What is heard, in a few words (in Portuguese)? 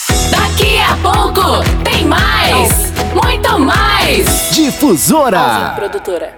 ENTRADA BREAK; PACOTE ENTRADA E SAIDA BREAK